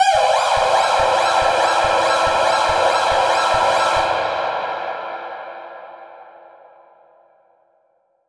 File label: Echo Proc A, alarm siren samples, 120bpm; musicradar